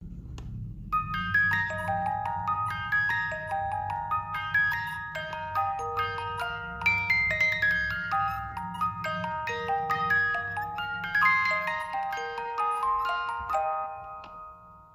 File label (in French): 18lames went the saints, boite a musique; Boîte à musique en bois personnalisable